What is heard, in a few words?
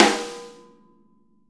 VINT KITS; Sonic Reality - Vintage Timetraveler